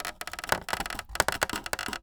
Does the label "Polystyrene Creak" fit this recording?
Yes